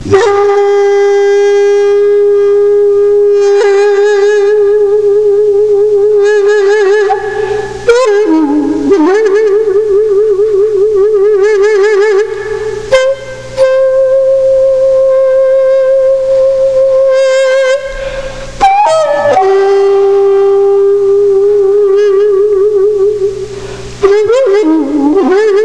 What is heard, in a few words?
taegum